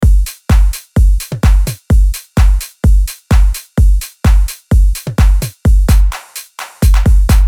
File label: Big Room Progressive House 128BPM